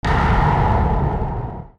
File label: WEAPONS